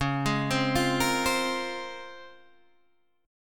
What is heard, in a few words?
Db Major 13th, Guitar Open G